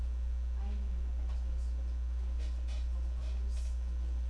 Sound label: cough